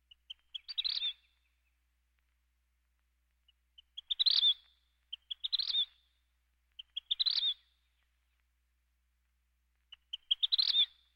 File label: Birds